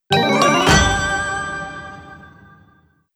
Jingle - Figure Acquired; master detective archives rain code original soundtrack 2023

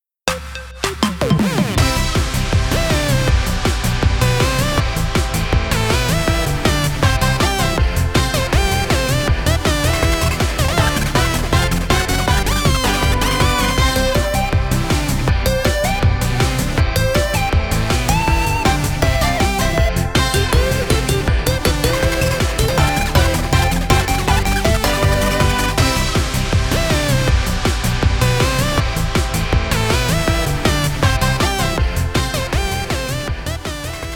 Pop Dance; Street Fighter 6 Beta; Xbox Series X/S

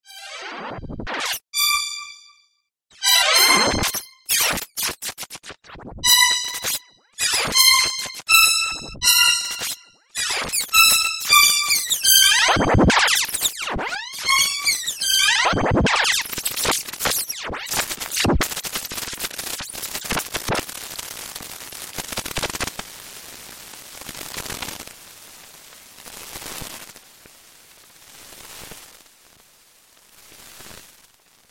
Ending Music; Bloodungeon

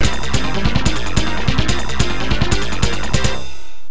Stage Clear; mechanized attack arcade

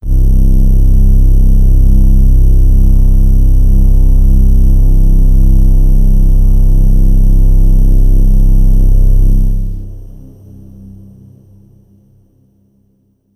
Downtown Overlay Drone

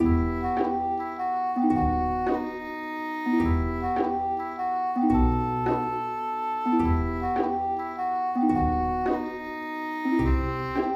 Layer A Horn; tintin reporter cigars of the pharaoh

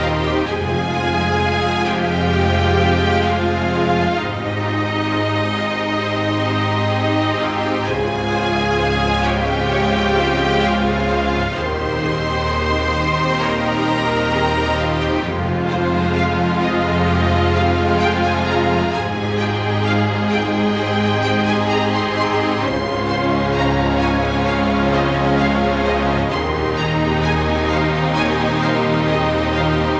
Nether Level loop; Rezrog; Depard; Gems of Rezrog

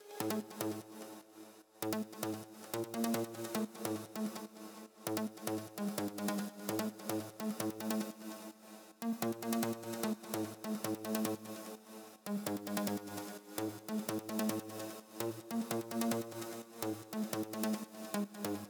Frantic Mix Stem Loop Layer; Atari; Asteroids Recharged

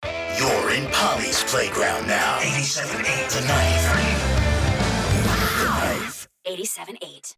(Jingle 4); The Knife 87.8

